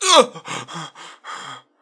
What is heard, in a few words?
low health; doctor